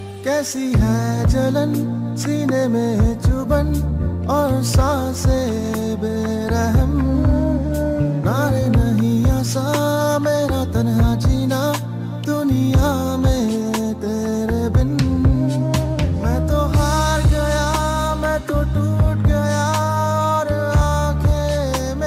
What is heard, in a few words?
Sad Hindi